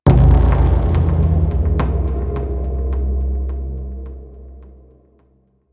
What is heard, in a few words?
UI Stinger Hit; starcraft 2 wings of liberty